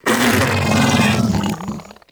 Bug Vocal Death; Characters; Warframe; Hunting Critters; InfKDriveBug

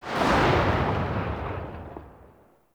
Tail C, Weapons; Warframe; Corpus; Tenet Quanta

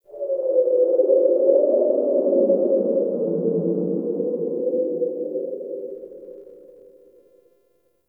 Forest Cave Howl Mid; Warframe; Grineer

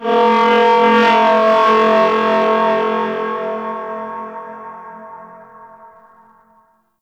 Temple Chant Texture; Ambience; Corpus Ship; Temple One

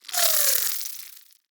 Inf Uzi Proj By; Infested; Weapons

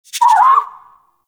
Rabbit Ambient Call; Warframe; Duviri

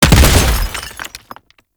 Ammo Explosion; Warframe; Frumentarius; Ammo Ability

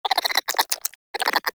Vocal Idle, Critters; Characters; Warframe; Cryptilex; Hunting Critters

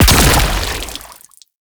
Grenade Explosion; Warframe; Scaldra Grenade Launcher

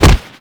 Fighting Blocked Impact, Weapons; Warframe

